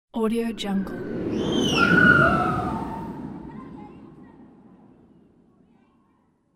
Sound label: passing screaming people at funfair